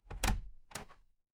door open

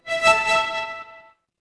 feedback achievement

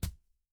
impact punch

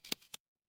feedback achievement

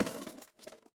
impact crash

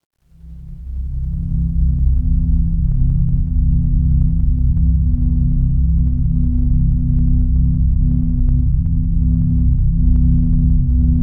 ambience room tone

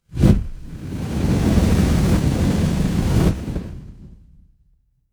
fire electric whoosh flame

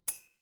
glass shatter

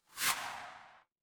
transition sweep

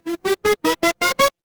retro game power up

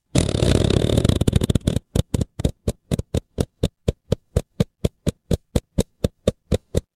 fire electric arc

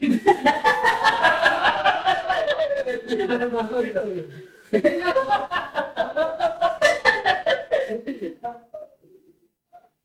crowd laughter

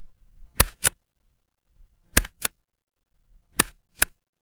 office stapler

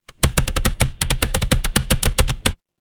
office keyboard

